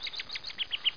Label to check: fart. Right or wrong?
Wrong